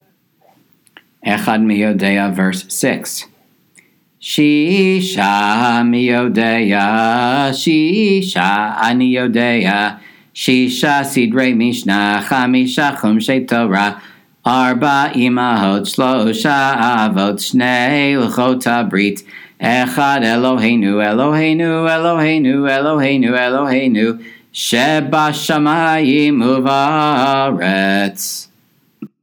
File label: Ehad Mi Yodeah verse 6; Passover Seder